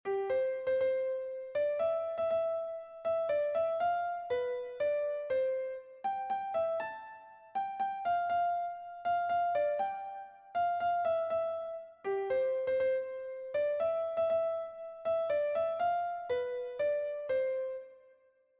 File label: O Christmas Tree Piano